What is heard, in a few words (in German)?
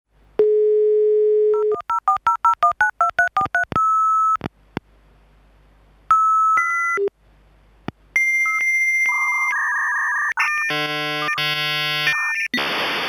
Modem Tonfolge